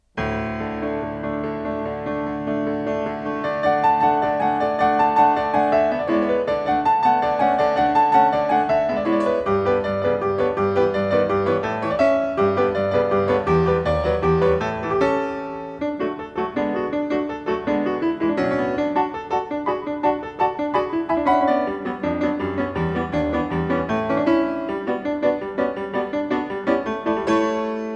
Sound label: polka; Rumunska